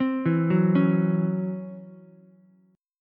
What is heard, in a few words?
Db Minor Major 7th Double Flat 5th Db down Ukulele; arpeggiated; DbmM7bb5; JGuitar